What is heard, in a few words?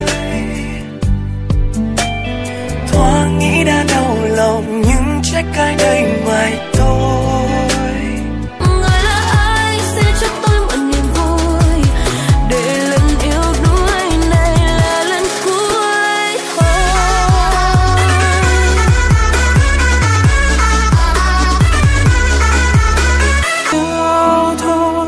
vietnamese ringtone